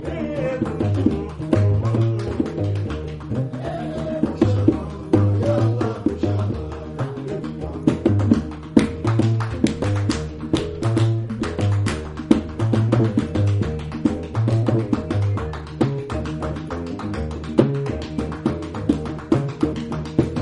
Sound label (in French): extrait musique gnawa